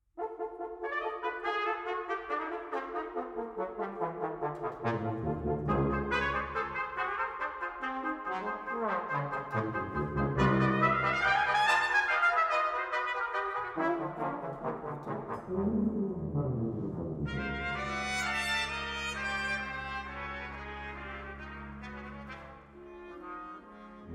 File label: Partita for 11 Brass Instruments